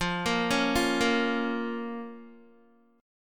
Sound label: F Suspended 4th Sharp 5th F, Guitar; Fsus4#5; arpeggiated; 13,13,11,10,10,x